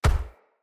Step Default, Footsteps

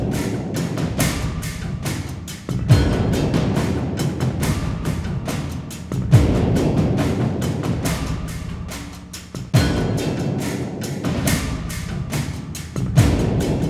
Scene Loop Music; Warframe; Cinematics; War Within

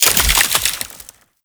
Void Heavy Scythe Crystal Detach; Warframe; Duviri; Incarnon Adapters; Melee

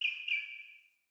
Bird Double Chirp; Josalfar Bird

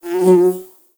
Exterior Foreground Bug Flyby; Ambience; Warframe; Duviri

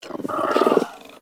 Puppy Interact Affection Closed Mouth; Vocals; Warframe; Kubrow

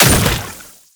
Brood Projectile Impact; Warframe; Oraxia

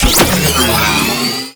Rifle Secondary Charge Up; Warframe; Weapons; Tenno; Rail Jack

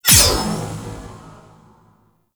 Boss Fire; Weave Boss

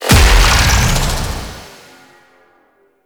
Necramech Melee Charge Explosion; Warframe

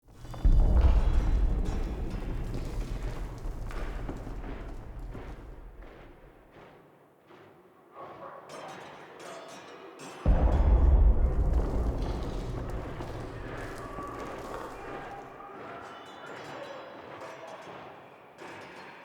Castle Interior Distant Battle Loop; Ambience; Midrath Island; Warsong Prologue

